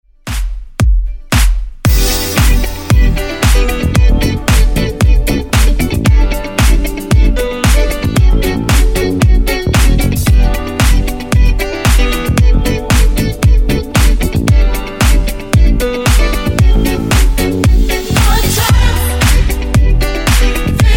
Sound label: Remix; Redrum